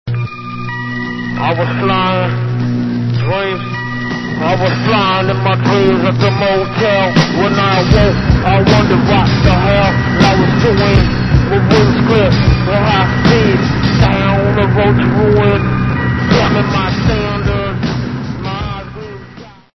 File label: truck